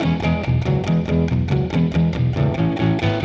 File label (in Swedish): Popmusik